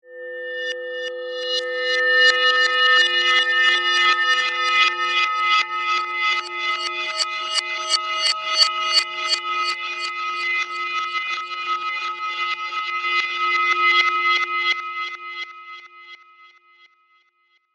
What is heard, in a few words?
Musical Reverse, Production, Element, Musical, Reverse, Bells, Eerie; Musical Sound Design; BlastWave